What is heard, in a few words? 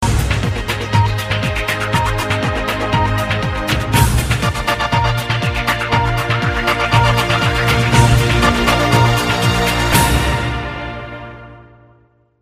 countdown ringtone; bbc; english ringtones